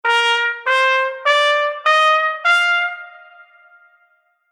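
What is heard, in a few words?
Horn Marcato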